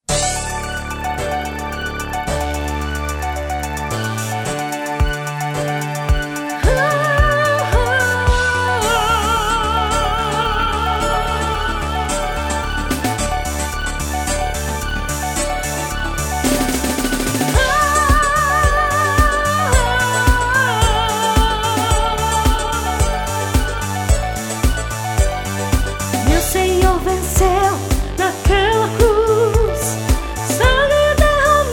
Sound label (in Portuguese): música